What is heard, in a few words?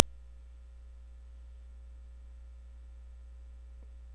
giggle